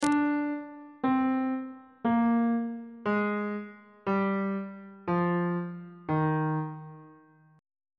d sharp major scale descending on bass clef

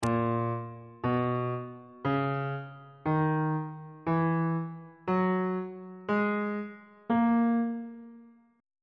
b flat locrian mode on bass clef

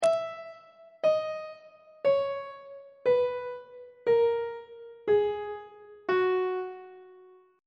f sharp mixolydian mode descending on treble clef

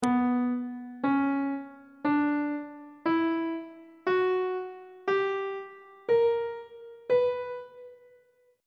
c flat harmonic minor scale note positions; on treble clef

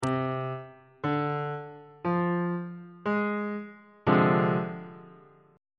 e sharp minor 6th 2nd inversion on bass clef

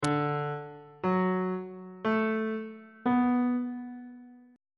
e double flat major 6th chord intervals

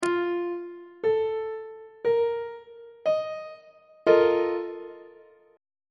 a sharp major 7th suspended 4th 2nd inversion; on treble clef

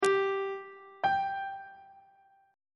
g note on treble clef

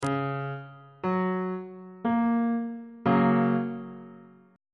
g flat major 2nd inversion on bass clef

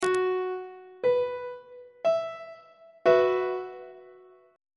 e suspended 2nd 1st inversion on treble clef

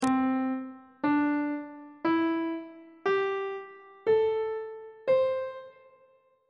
c major pentatonic scale on treble clef

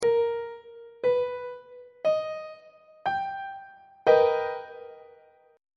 b augmented major 7th 3rd inversion; on treble clef

